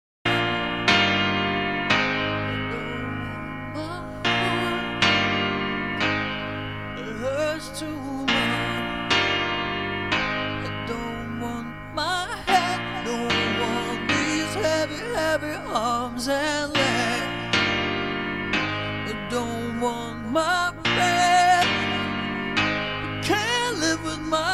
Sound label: piano version